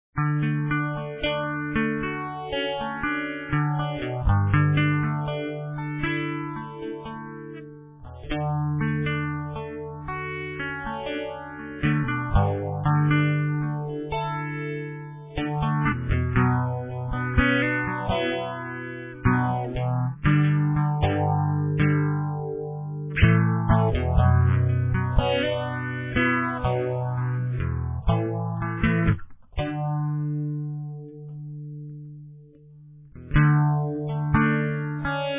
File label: acoustic